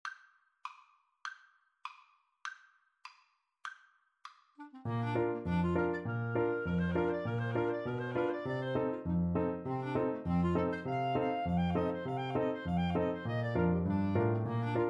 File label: Clarinet Polka for Clarinet Duet; with piano